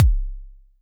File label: BD; TRX